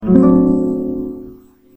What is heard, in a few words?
WIN; Him & Her